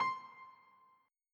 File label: beep